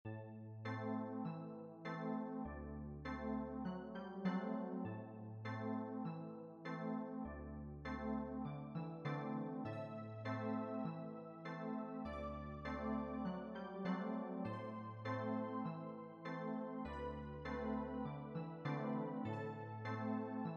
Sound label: Mestoissimo, piano